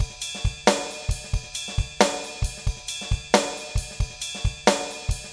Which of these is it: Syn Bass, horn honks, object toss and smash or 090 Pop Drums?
090 Pop Drums